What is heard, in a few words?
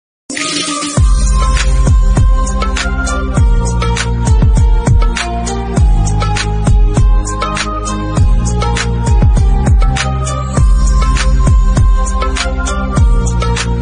instrumental